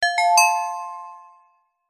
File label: multimedia alert notification basic bell synth positive chime; zapsplat